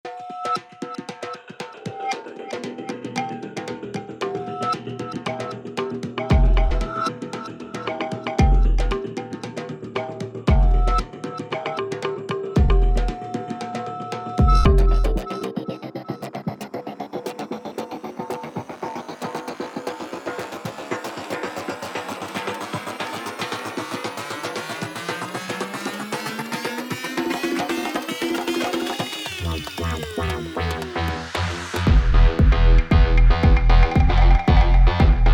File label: reso twin cypher beat; weeklybeats-2020